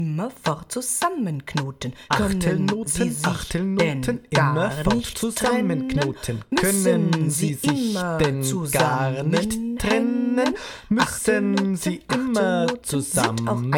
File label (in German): Sprechkanon zweistimmig; Der Rhythmus-Globetrotter